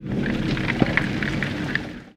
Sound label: barren planet; ambient; Stellaris